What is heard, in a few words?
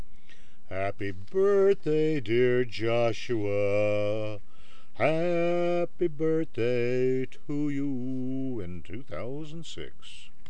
birthday singing